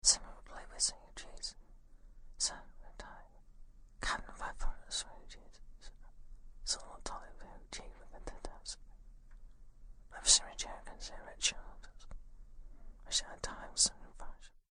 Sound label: whispers